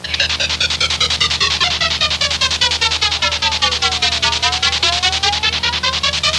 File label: NEAT GTR